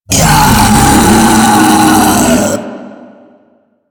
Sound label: Infested Ancient Taunt Scream; Warframe